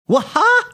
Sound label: whaha